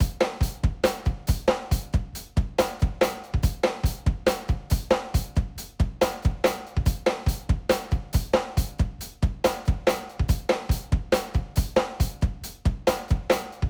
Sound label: rock